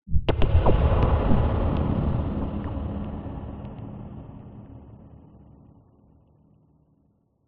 sound design cinematic hit dark mellow delayed elements